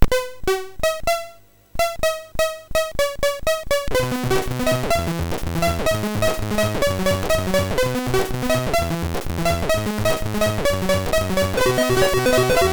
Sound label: Multihardcore, MOS6581R3